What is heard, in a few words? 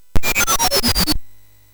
Final Synth Sample, MOS6581R3; hvsc